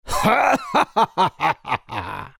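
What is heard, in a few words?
laugh, Chinese; Dota 2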